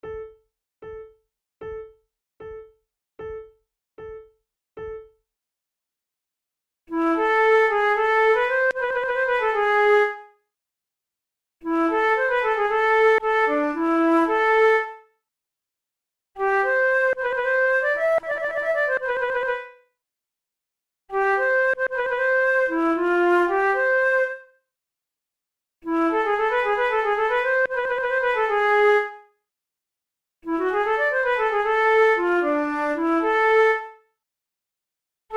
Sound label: two flutes; Monteclair; 6 concerts; la terpsichore; flute tunes